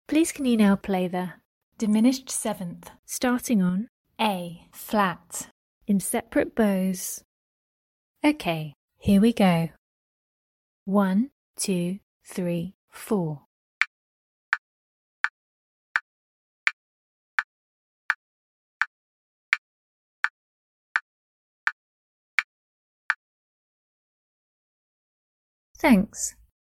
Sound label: dim 7th Starting on A flat sep bows